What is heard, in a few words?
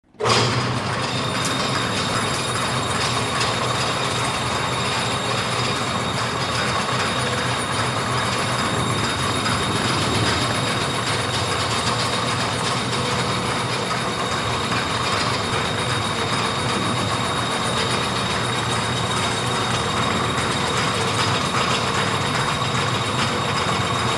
Switch On Run High Ton, generator